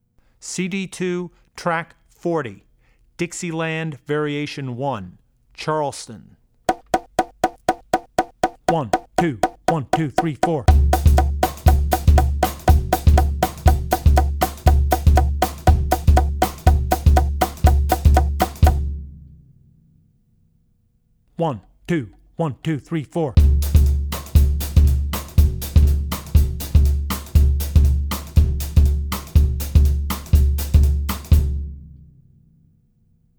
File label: Jazz - Dixieland (Charleston); Drummer's Bible Tracks